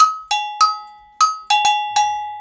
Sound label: 100 AGOGO